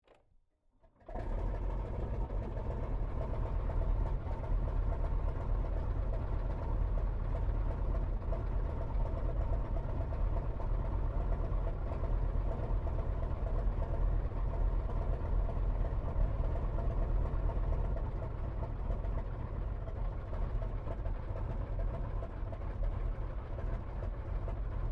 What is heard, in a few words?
AIRCRAFT 1916 SOPWITH PUP REPLICA STARTUP IDLE INTERIOR STEREO; Replica of a 1916 Sopwith Pup; Airplane; Aircraft Collection